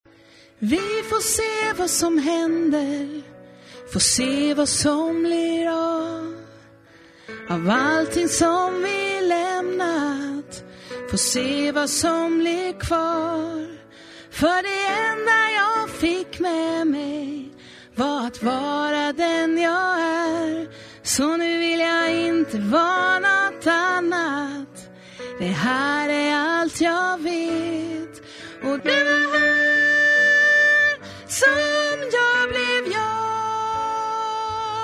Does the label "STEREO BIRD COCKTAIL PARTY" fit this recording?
No